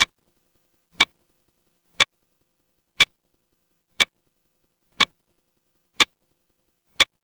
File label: mechanical clock tick